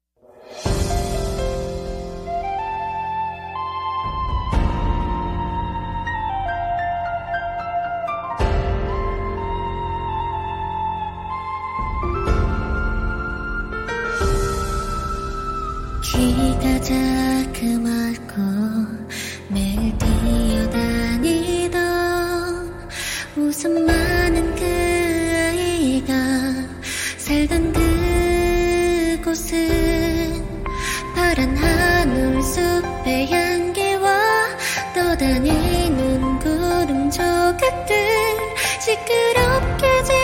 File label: ost; 쿠키런